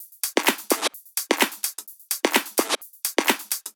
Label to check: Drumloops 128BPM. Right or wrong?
Right